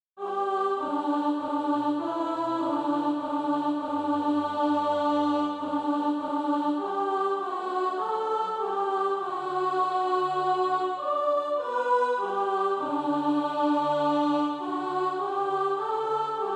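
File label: alto, SATB Practise Music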